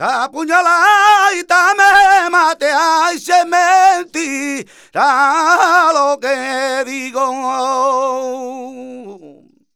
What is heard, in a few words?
voc c, Flamenco Vocals Male Cantaor; Best Service ProSamples vol.46